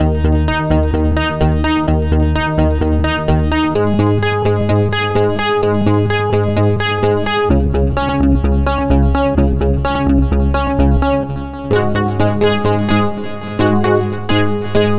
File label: 80s bass; Session 09 - Retro Fit